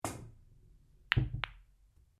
Billiards Cue Ball Cushion Ball, Home billiards table; Pool; Sports